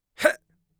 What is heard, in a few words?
hut, Mage Voice Pack